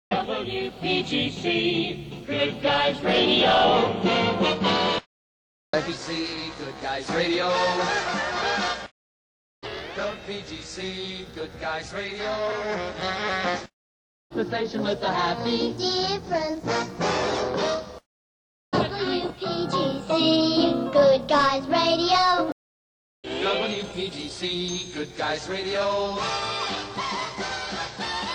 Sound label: jingles montage